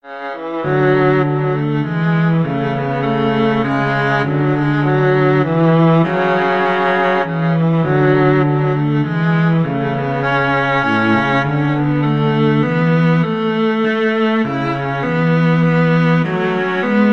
jupiter, viola cello duet; holst

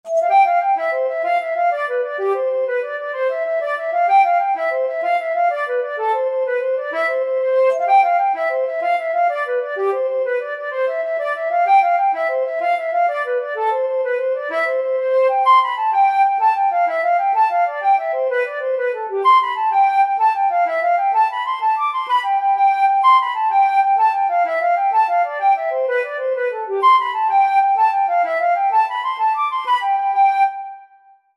trip to the cottage, flute

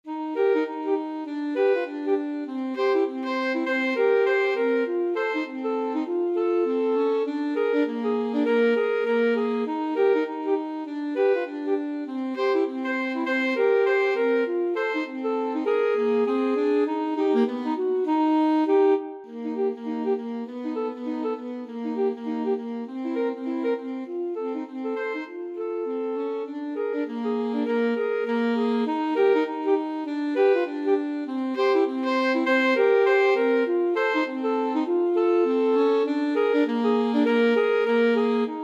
alto saxophone duet

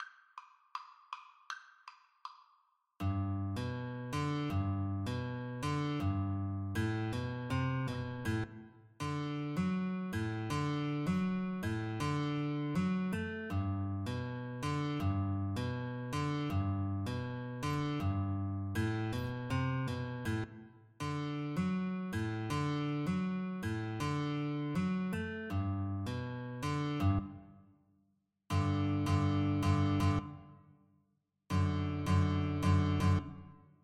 Guitar Duet

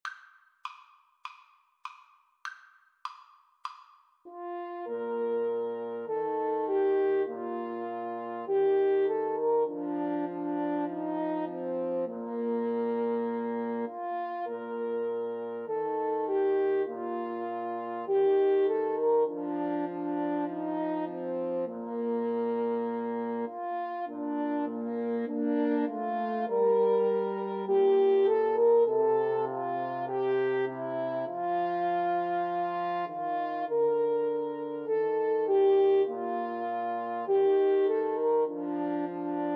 blue bells scotland, French Horn Trio

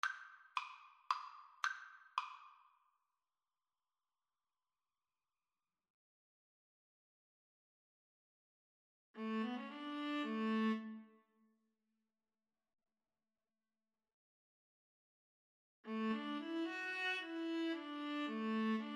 Viola Duet

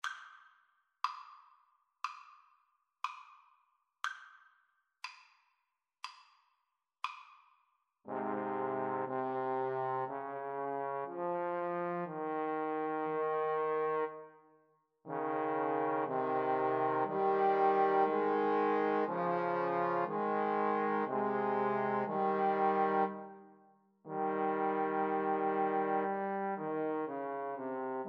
romance, Trombone Trio

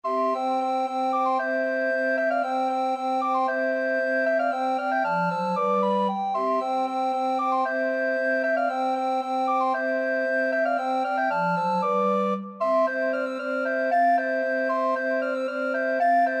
recorder quartet

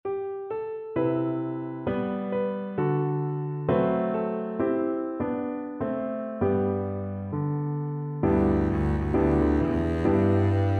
this little light, double bass

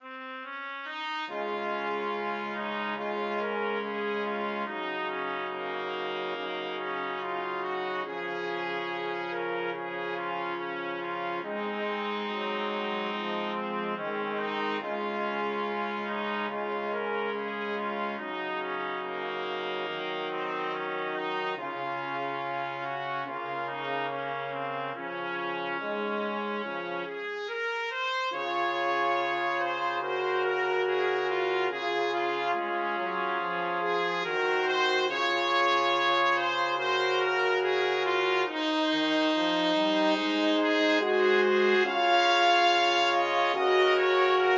danny boy BRASS, brass quartet

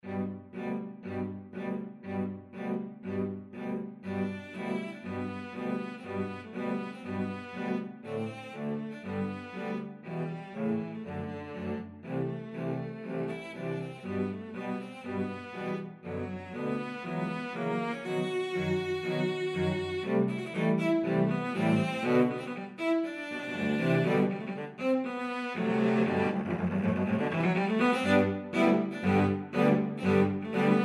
cello quartet

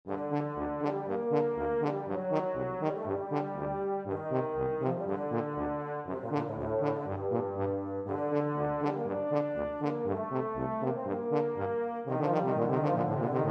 tuba duet

